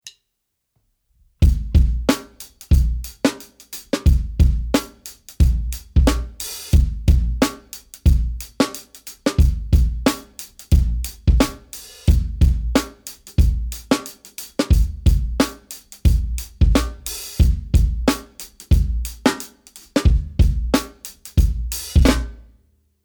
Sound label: Hip Hoooop